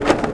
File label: bt7 stop, treads tractor; World of Tanks Blitz